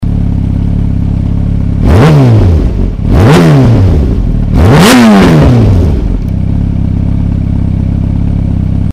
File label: akra racing; akra r1